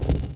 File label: Click; BG2; Msstyles; Styles visuels